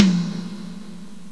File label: Drum